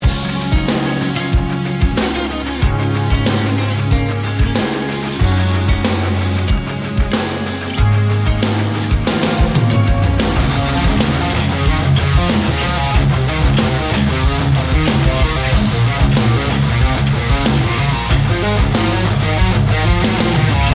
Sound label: Resurrection Instrumental